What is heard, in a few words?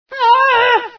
scream, female sci